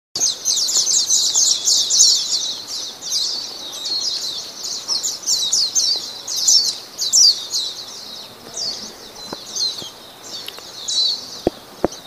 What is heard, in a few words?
mejiro, birds